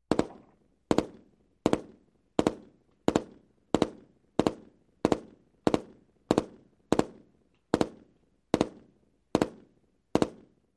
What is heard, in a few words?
M16 Double Taps Stere